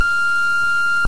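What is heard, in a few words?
kissoff, Ademco ContactID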